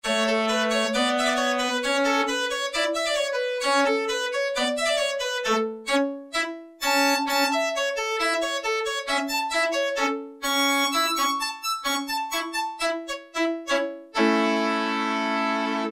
STR QUARTET, Casio VZ-10M; VZ ROM Card

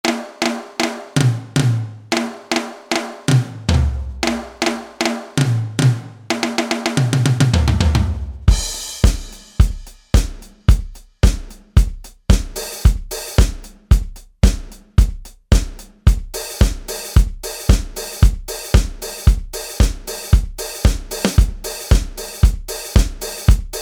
Disco KIT, Roland SRX-01 Dynamic Drum Kits

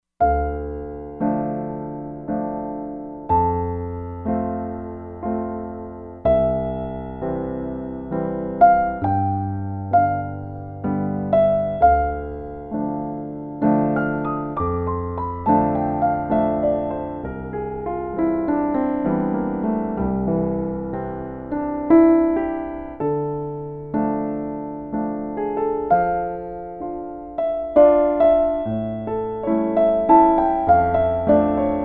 Dark Grand, Roland Fantom XR

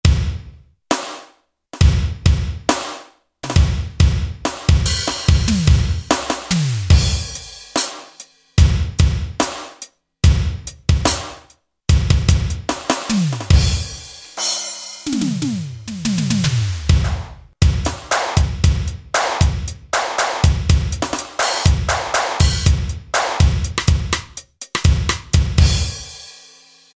Pop Kit; Roland L-CDX-01 Rhythm Section Instruments

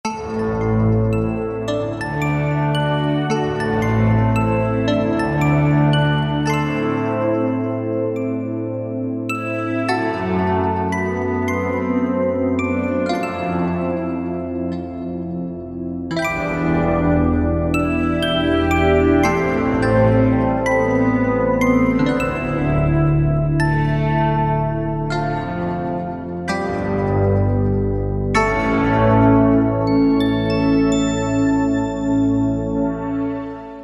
Korg M1 CloudNine; Factory preset examples

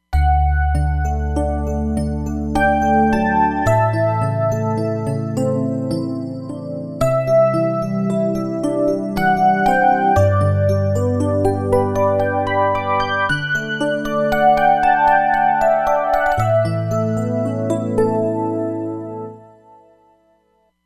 EP Belle

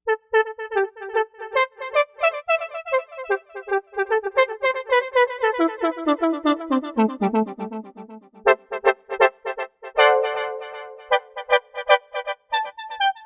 Novation UltraNova; Factory Patches

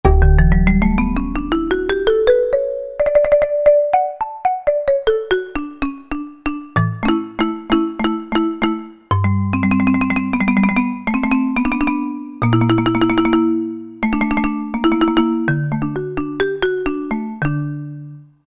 Marimba, Roland JD-990; Factory Patches

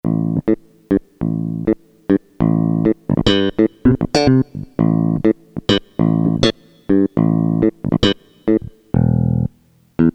E. Bass; Korg DSS-1

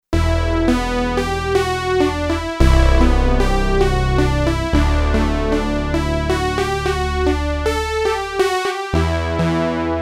Memorymoog, Kurzweil K2500X; Factory Programs

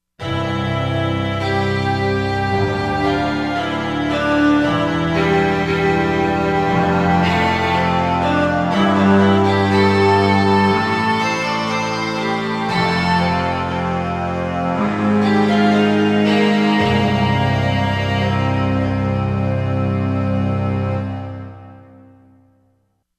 Buzu Str, Korg MSC-13S Ethnic 2

